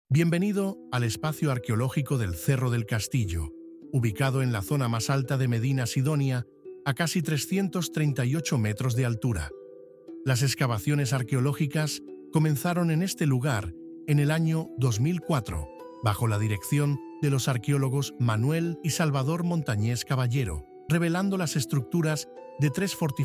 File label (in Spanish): audioguia castillo música